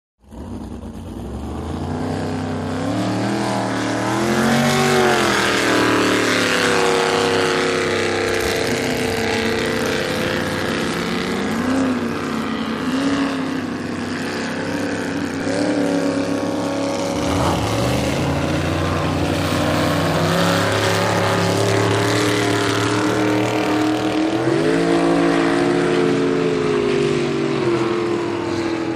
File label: Boat In Out, motorboats